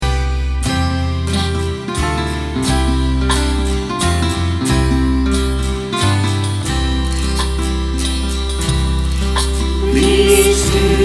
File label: choir